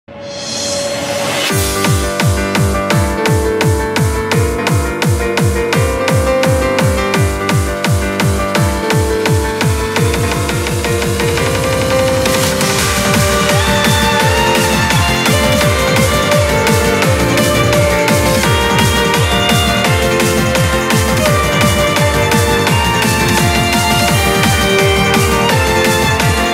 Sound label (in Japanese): かっこいいフリーBGM 燃え上がる神々の戦闘BGM